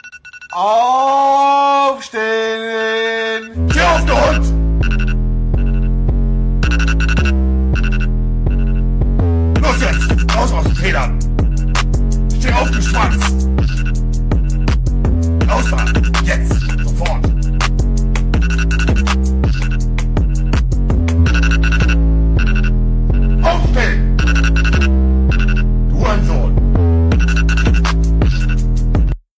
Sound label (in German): wecker ringtone; montanablack